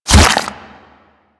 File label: Bullet Flesh, Impacts; Warframe